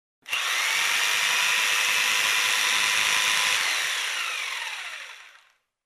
Electric Hand Driller

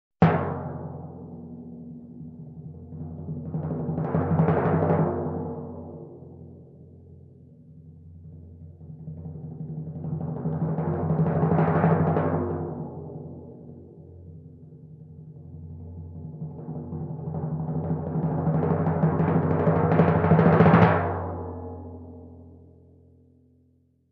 Multiple Timpani Crescendos

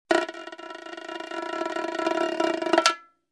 Fast Bongo roll

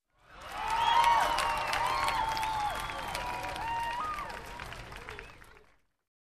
Short Cheers and Applause

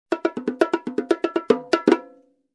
Bongo Ending Groove

